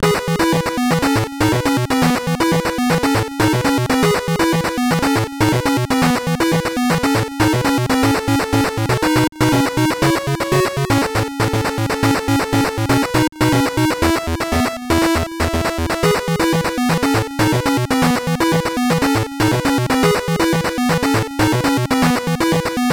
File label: BGM; Block Kuzushi; GB; SGB